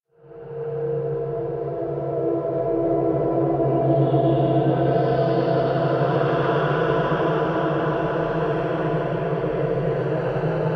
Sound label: procession music; darkness within 2 the dark lineage